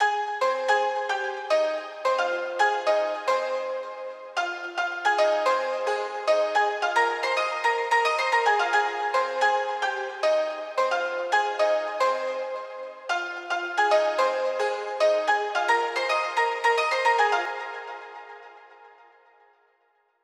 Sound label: Melody